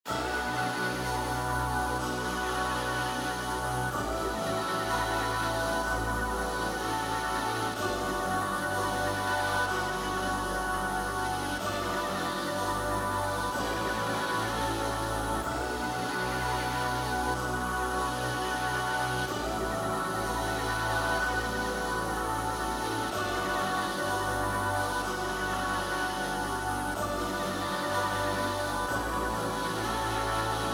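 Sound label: G minor 125 BPM Brass Vocal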